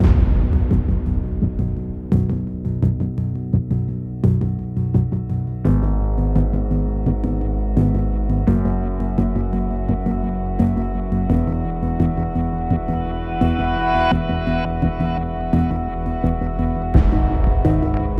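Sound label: Music Ambient, Ambient Post Race 85bpm; Forza Motorsport 6 Apex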